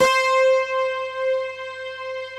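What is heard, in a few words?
Bright Guitar Strings; Optical Media International - Sonic Images Library; Eastwind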